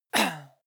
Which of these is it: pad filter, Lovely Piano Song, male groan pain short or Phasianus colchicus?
male groan pain short